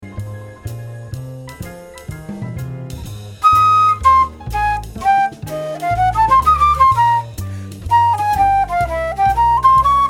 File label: Dim Chord